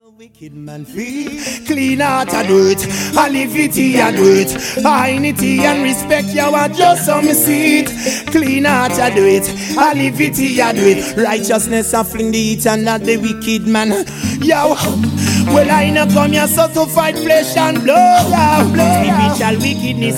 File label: [Semi Accapella]